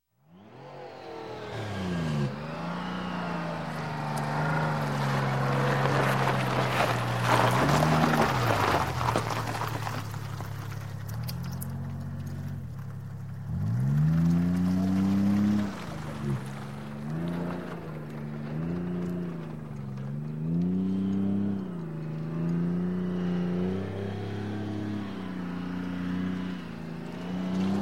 Military Tank; Sound Ideas 2000 Series

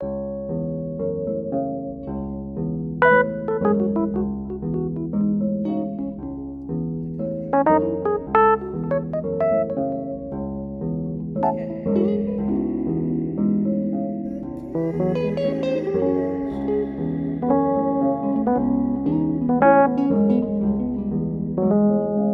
theme music no foley; Pitch Black - A Detective's Demise

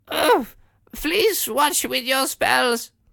Bringing Tea Interrupted; AudioWizards

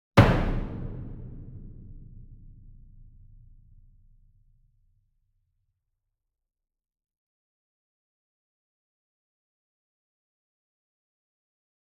SINGLE DRUM Verdi 66 Inch Hit Hard Beater Medium; boom library; Cinematic Strikes Construction Kit

